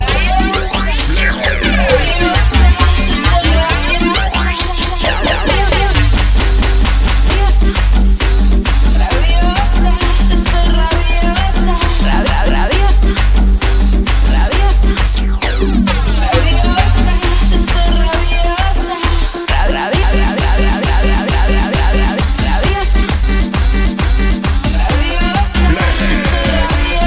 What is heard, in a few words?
tribal